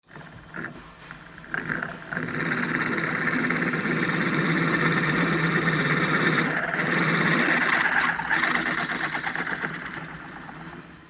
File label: car workshop drilling